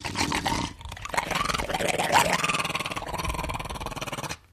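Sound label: Pit Bull Short Gargle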